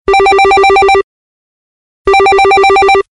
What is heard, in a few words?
3d digital ring